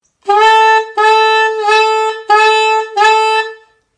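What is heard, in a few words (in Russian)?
ля первой октавы с атакой плавает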